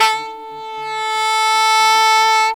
SAX; AMG - Now CD-ROM (Roland)

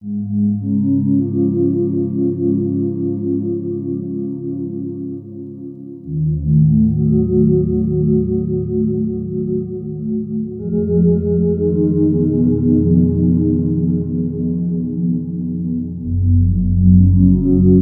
Ambi Cloud, Keyboards; Sonic Foundry (Sony Creative Software); World Pop

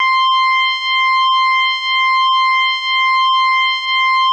DORGAN C5, ORGANS; Music Store Sound Service; Einstein

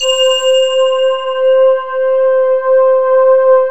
DIGI BLEND, Emu Classic Synths; E-MU Formula 4000 Series Vol. 3; Analog Odyssey

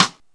SP1200, DRUMMACHINES

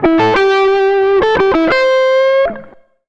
SOLO; Univers Sons; Jazzistic

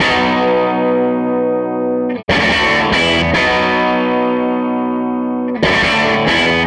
SG Guitar Loop

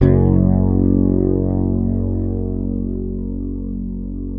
Fingered bass F#1 L; Sampleheads; Will Lee Bass Library

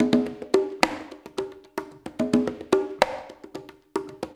CONGAS; Spectrasonics; Liquid Grooves 1 & 2; CONGAS+BONGO

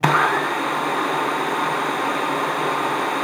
luft, alto sax; Xsample VOL-2

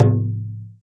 Taiko, Percussion; Roland BK-5

